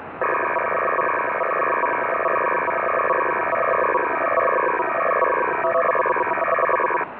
SELSCAN, ROCKWELL SELSCAN, 4-TONE 300 Bd

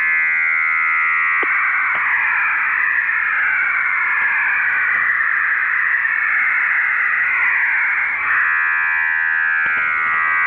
CIS 1200 serial; in idle and traffic state; CIS 1200Bd serial tone modem in idle and traffic state; PSK-systems

